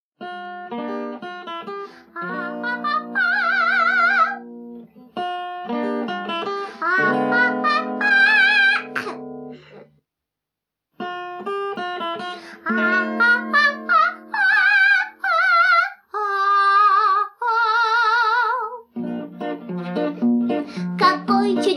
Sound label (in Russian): Песенка Мышонка